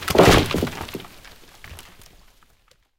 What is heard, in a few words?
tree fall; War Thunder